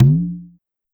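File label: Conga Lo Up, Drum Machines Clavia DDrum-AT Pitch Envelope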